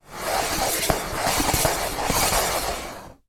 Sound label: foley plastic object spin; zapsplat